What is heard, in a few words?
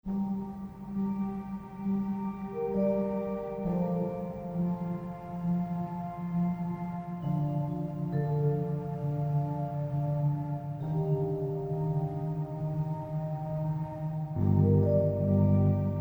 orchestral